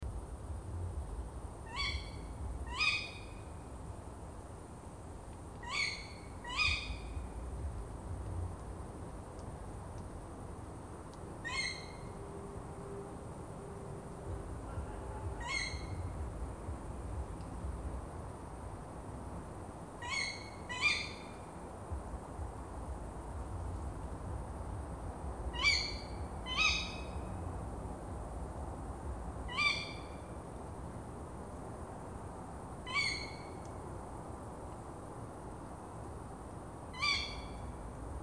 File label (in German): waldkauz